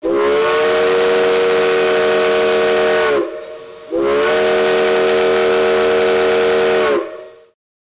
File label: Steam Train Whistle